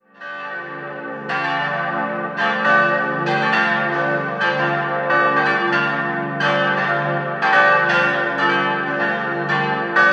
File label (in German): Ingolstadt Rupert, Glocken